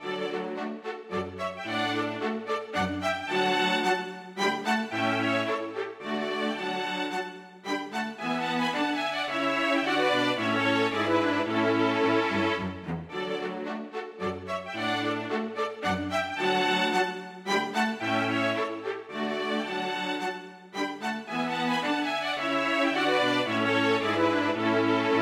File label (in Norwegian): Menuet for strykekvartett